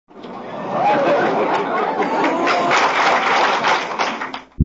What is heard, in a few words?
applause